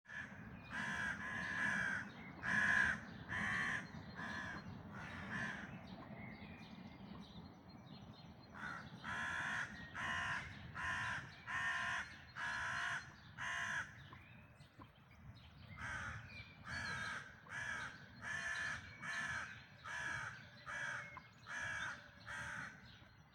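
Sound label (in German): Dawn Chorus, Vogelgesänge vor Sonnenaufgang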